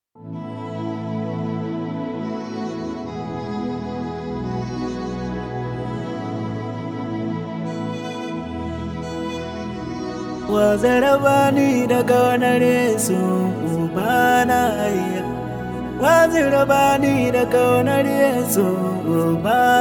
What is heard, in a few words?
Strings Version